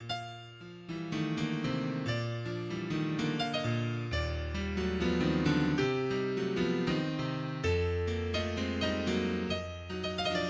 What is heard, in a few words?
Prelude in B Flat Major